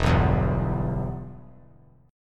E-Minor; strummed; JGuitar

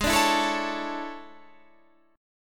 E 13th G sharp

